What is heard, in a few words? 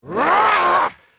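attack; The Elder Scrolls III Morrowind GOTY; XBOX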